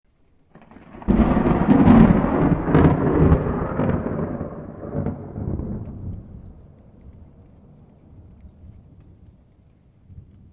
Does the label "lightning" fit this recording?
Yes